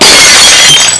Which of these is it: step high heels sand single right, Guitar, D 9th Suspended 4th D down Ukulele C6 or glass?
glass